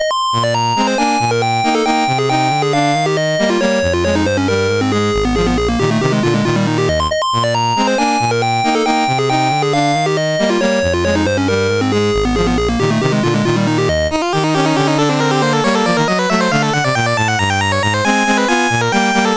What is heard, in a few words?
Polka, CSG8580R5